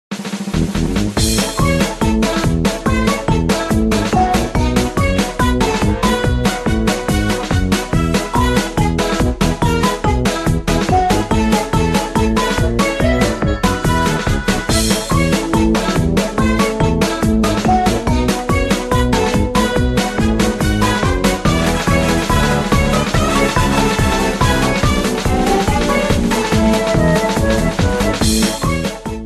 "Item Minigame" theme

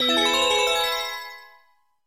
Question Coin

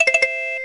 The "Pause" theme

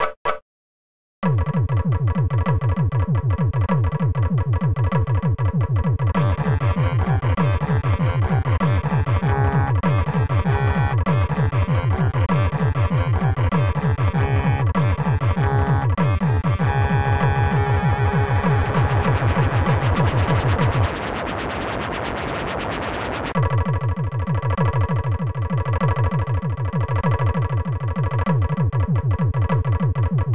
Flyswatter Level 2; Mario Paint

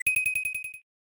Pause Game; WC98

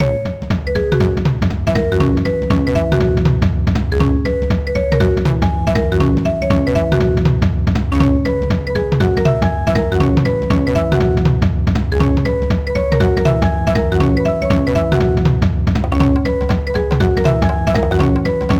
Menu; Cannon Cove

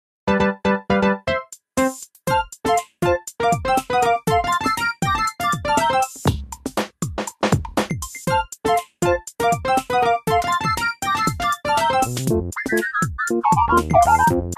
Bonus Game, Song; SMR

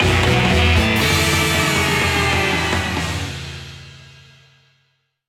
Impact

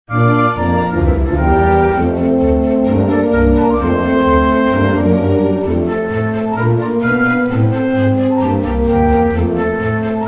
Snow; SMM2; SMW

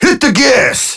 Alarm Clock

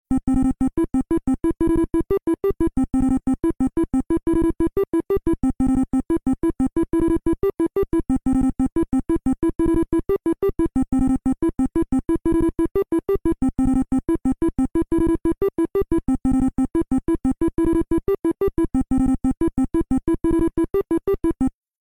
Hammer Theme; NES

